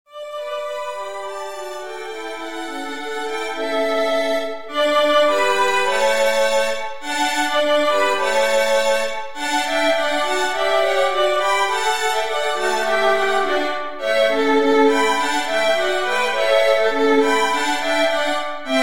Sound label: violin trio